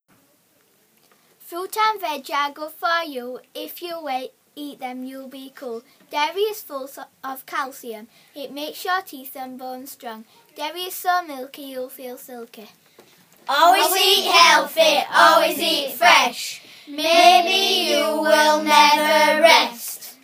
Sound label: rap